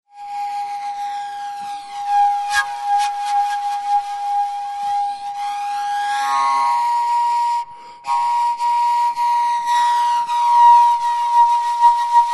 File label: jungle flutes